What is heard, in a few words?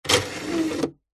household dvd player disc tray open